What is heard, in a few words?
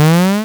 tron reset; w00tguy; arcade